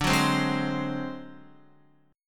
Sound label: D 7th Flat 5th D, Guitar; D7b5 strummed; JGuitar